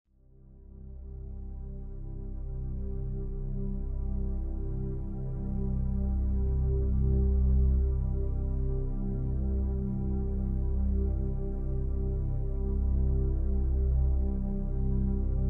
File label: Aural Resonance